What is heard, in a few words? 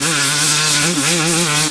Power, Engine